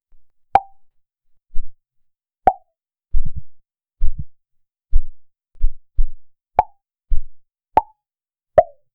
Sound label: realistic chiropractor, neck